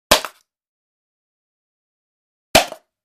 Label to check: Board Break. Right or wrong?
Right